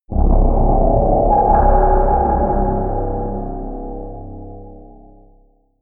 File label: scrapes tensing scariness, drones